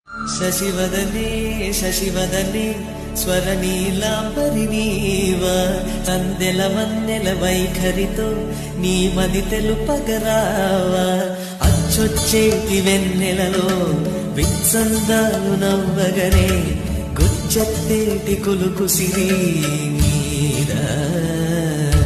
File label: songs melody song